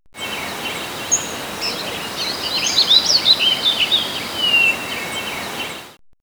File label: Nature, Springtime; X-Static Goldmine